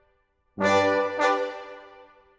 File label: Brass